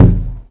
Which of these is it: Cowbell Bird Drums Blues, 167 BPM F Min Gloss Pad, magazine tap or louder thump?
louder thump